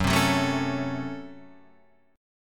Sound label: Db Major 7th Suspended 4th Sharp 5th Gb